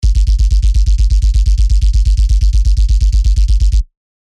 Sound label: Sub Test; SERUM